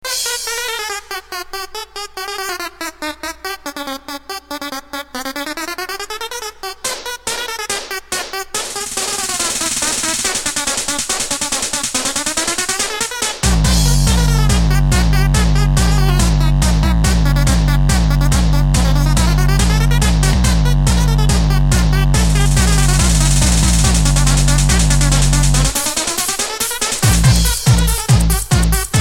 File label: CLOWN SONG RINGTONE